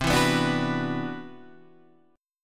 C Major Sharp 11th, Guitar Half Step Down; CM#11

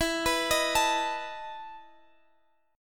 E Major 7th Suspended 4th E, Guitar, Open G; chord shape

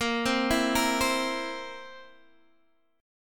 Bb Suspended 2nd Flat 5th Bb down Guitar Open D

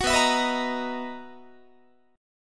B Major 11th B down Guitar; strummed

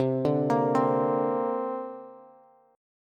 C 7th Suspended 2nd, Bass; C7sus2 arpeggiated; JGuitar

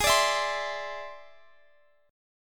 E Augmented 7th Ab, guitar

